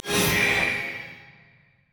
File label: deathparticle; leona